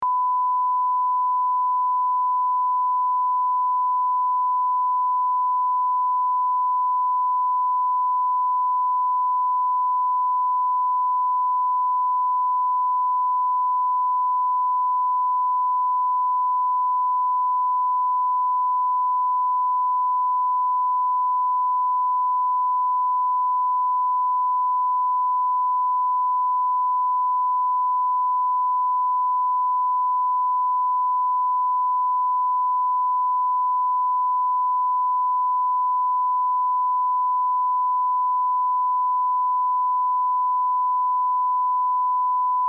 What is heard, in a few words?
TV beep